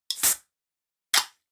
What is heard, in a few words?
Beverage Can Pull Tab